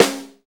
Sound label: full snare drum c sharp key; snare drum samples